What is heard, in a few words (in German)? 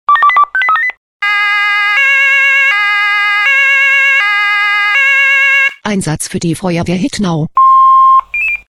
SMS Alarmierungstone